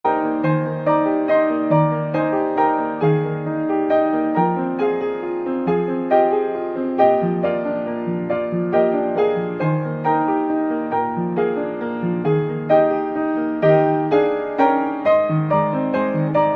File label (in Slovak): klavír; hudobný nástroj